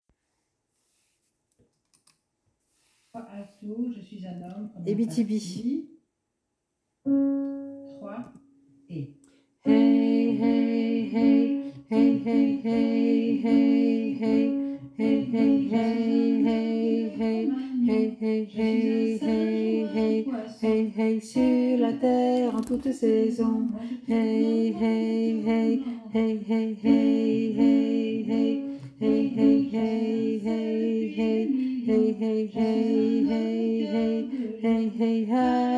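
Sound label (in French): voix basse tenor baryton alto en fond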